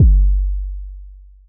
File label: heavy 808 sub kick